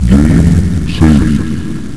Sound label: Saved; Grunt